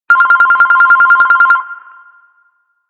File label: Business Ring, LG9600 Versa